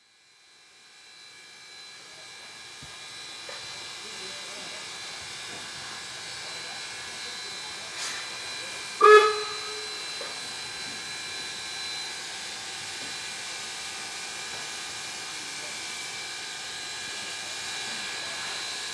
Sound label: Box flat towards Swanbank On train, steam